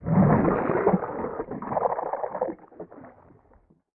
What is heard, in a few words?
Movement Large Wet; mvmt